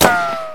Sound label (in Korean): 매지컬블릿, effect